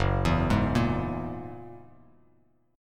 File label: F sharp 9th F sharp Bass Standard; F#9 arpeggiated